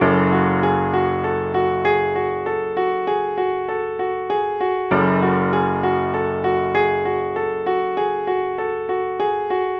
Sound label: Hip Hop Piano Dark